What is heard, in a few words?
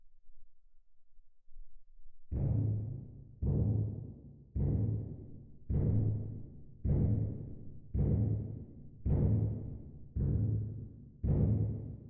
Twin R Perc